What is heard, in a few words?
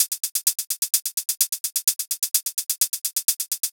128 hat loop easy